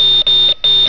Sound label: CARDIAC, ALARMS